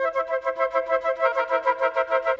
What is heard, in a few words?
Winds, Orchestral; Sonic Foundry (Sony Creative Software); Orchestral VOL-2; Modern 22